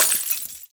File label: Broken Bottle Shatter; LooterKings